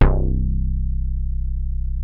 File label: HIP BASS, HIPHOP; Rubber Chicken Software Sample Bank